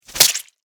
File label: Fly Swatter Hit, Squish; Extermination; BlastWave